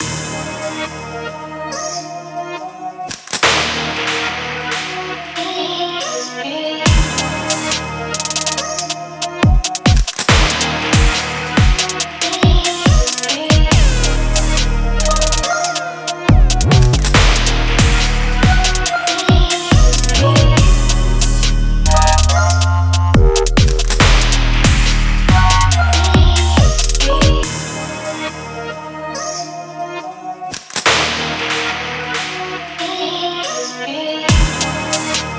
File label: Cold Bells; iMaschine